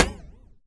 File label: impact Plate light